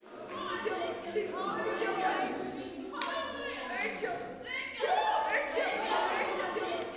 WORSHIP, Gospel; Spectrasonics; Vocal Planet Vol.1 Gospel

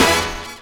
HORNHIT, HORN STAB; E-Lab; X Static Goldmine 2; akai

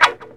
GTR WAH; FX GTR; E-Lab; X Static Goldmine 2; akai

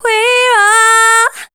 voice spectral, ly short

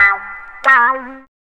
GTR RIF; E-Lab; X Static Goldmine 1

